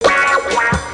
dbl wa

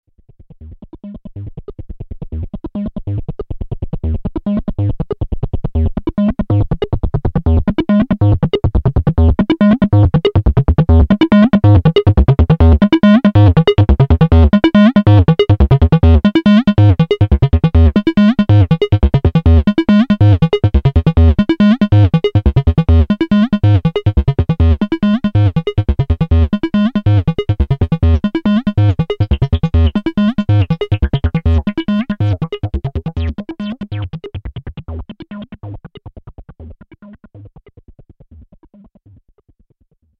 pulse overdriven

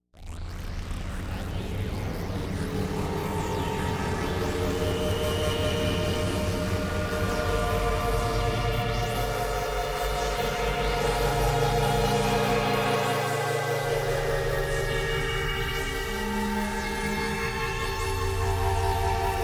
Andromeda Harmonic Pad

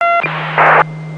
bleep and scrap

